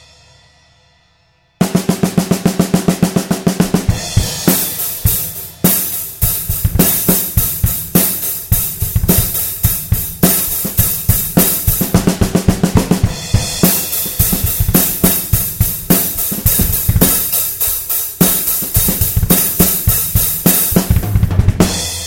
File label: drumming